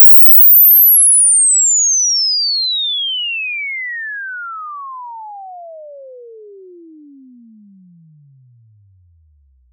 Inv Sweep 50Hz 20kHz

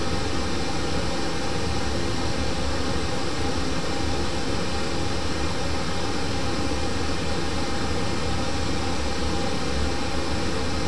Pink Noise

